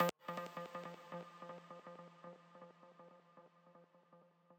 arp

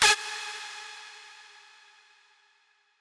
lead

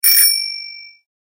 Default E-mail, notifications; 三星; Postman

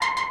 synth

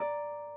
harp

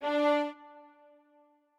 strings

